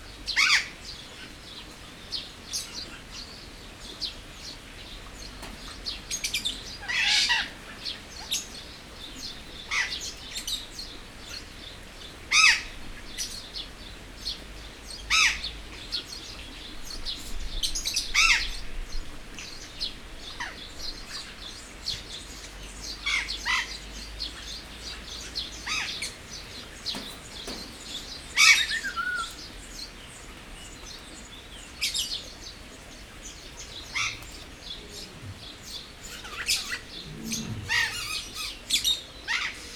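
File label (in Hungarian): madárcsipog dehangulatban oroszlán bömbölés; jaszberenyi zoo 2016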